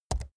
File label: keyboard laptop key press fast soft; kommunikation; computer